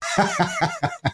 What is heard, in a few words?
Laugh; Andrew Tate